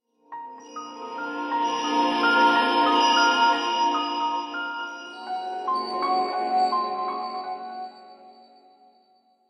Clock alert

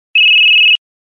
Loud Beep once; LG LG9600 Versa

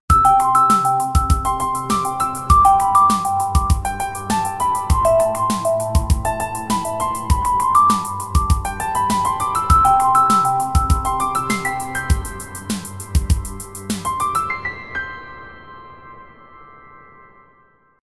Flying piano, ringtones; GFive G9

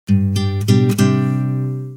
Acc Guit; PlusFourSix

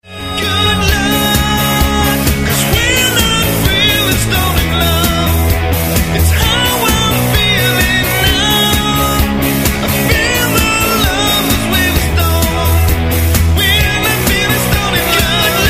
chorus part, ringtones; Arphiola ringtones; Soundalikes and covers

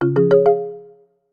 New Message, notifications; Asus Zenfone-10

